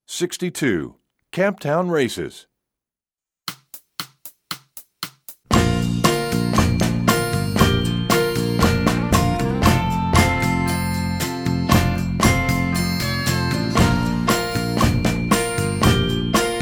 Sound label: Camptown Races, Percussion; EE2000 Book1